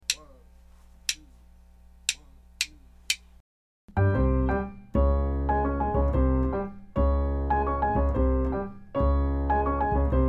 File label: minus Drums